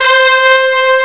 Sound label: brass C5; Star Trek JR Audio